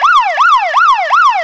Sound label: Siren Fast; LFS